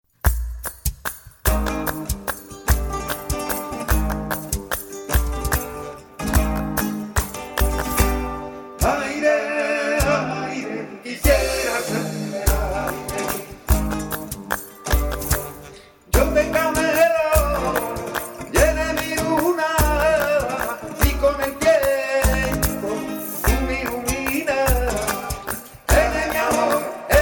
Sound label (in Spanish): Sevillana, flamenco